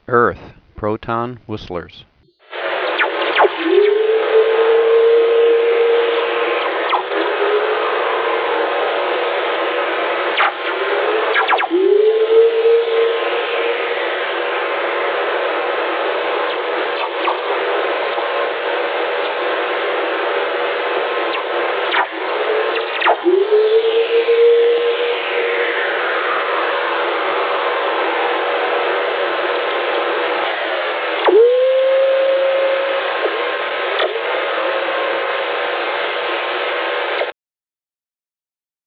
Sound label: geomagnet proton whistlers; uiowa